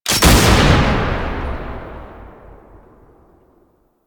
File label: Assist Shot; gameplay; Warframe; Nineteen Ninety Nine; Quincy